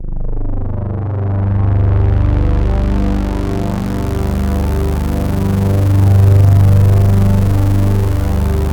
analog swell; Sound Gallery - Charged

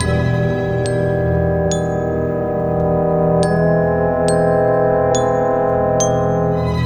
Cinematic Orchestra

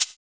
Shaker; Equipped Music; Loungin House

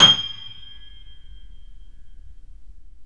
KEY, Steinway ff

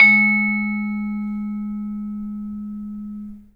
vibes; 3D Sound; 3D Keys VOL-1

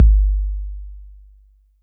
Roland 808 BD; Drums; Tr-808

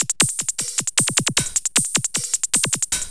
LOOP, Eurotech; Sounds Good

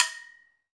Fijidera; Club-50 - Foundations Roland; Agogos

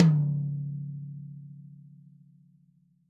DW Green Maple Tom; Sonic Foundry (Sony Creative Software); Vital Drums

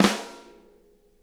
snare rim, drum kits; Sonic Reality; Interactive Drum Kits

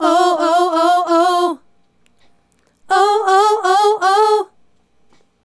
Female Group, Vocal; Sonic Foundry (Sony Creative Software); Vocal Toolbox

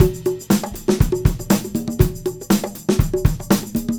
Combo, R&B Drums

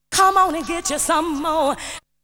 Vocal; ZERO G - Datafile DISC 2

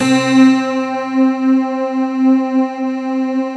WIRE PAD

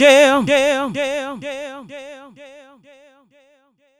"YEAH" VOX, Funky Vocals, ADLIB MEN; USB Soundscan vol.18; AKAI

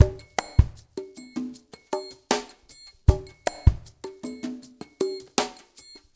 ICE DRY MIX, Liquid Grooves; Spectrasonics; Liquid Grooves 1 & 2

